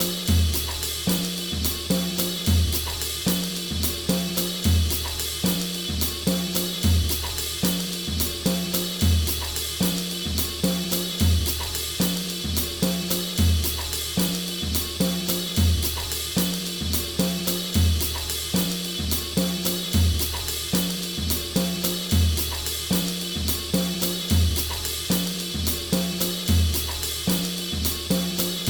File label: Drum loops Rhythmic prompt